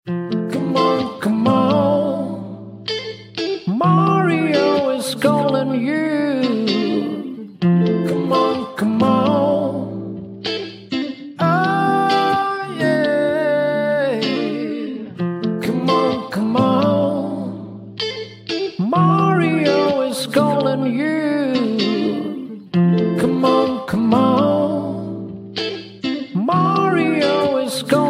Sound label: acoustic ringtone; mario is calling